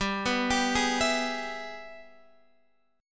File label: G sharp Minor Major 7th Sharp 5th, chord